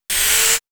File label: Spinner